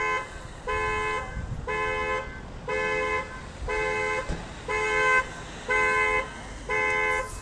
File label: at the gas station can't figure how to turn off honking alarm